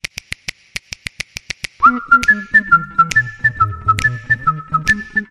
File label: Happy Crazy Whistle Swing, Sting